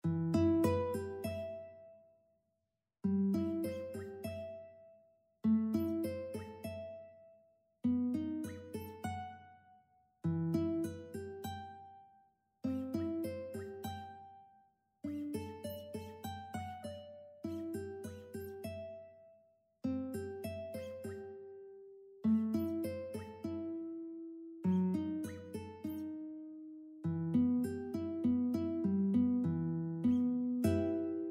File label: estudio, guitar; ponderoso II